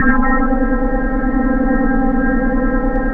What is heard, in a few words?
ATM TONAL; Best Service - XXL Production Effects